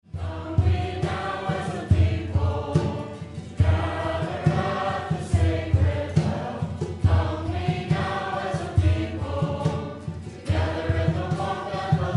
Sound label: chant come we now as a people